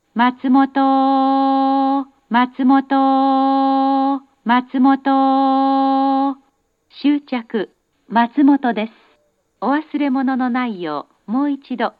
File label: stop; jr-east